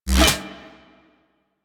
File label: Fishing Spear Line Retract Catch; Warframe; Venus Fishing Spears